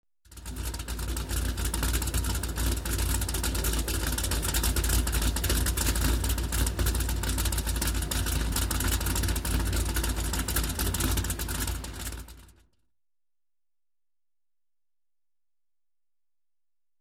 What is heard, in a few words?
Cruiser Motorcycle Engine Idle